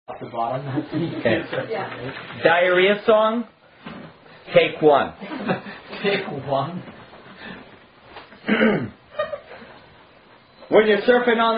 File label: Diarrhea Chh Chh (Pitch Shifted)